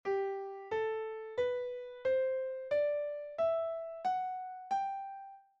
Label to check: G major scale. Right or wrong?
Right